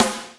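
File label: Attack S, Boss DR660 snare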